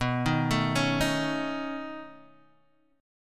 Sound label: B 9th B down Guitar DADGAD